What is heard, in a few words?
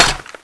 clip out; tfa cso; hk121